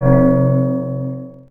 Noise; aloneinthedark4

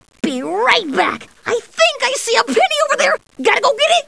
click

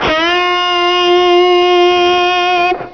ELEC GUI, STRINGS

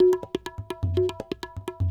PERCLOOP ETHNO 125; eJay

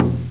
Taiko Drum (C4); X-Wing; X-Wing Patches

